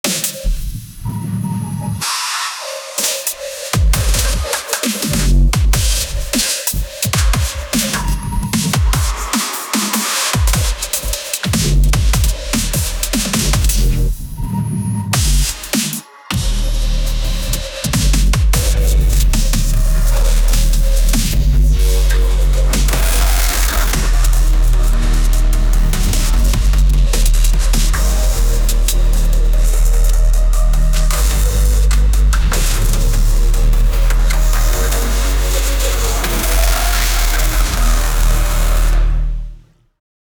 dub step, bass heavy

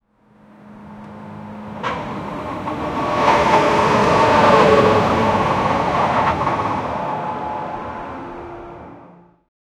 old truck pulling away

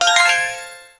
magic

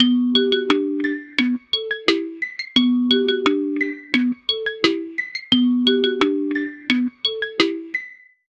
old timey song 87bpm; SFX Engine

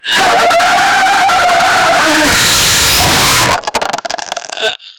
loud explosive diarrhea

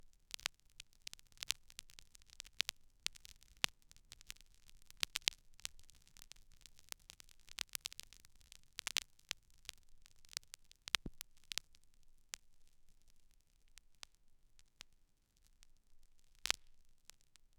soft bone cracking with c, health

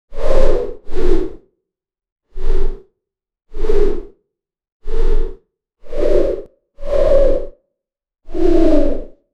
video game dragon flappin

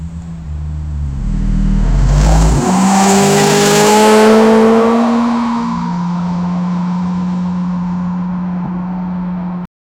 a powerful sports car lau

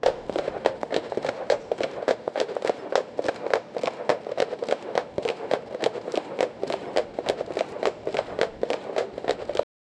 sprinting on track shoes

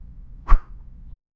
a rose being thrown

